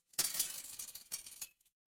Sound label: glass shatter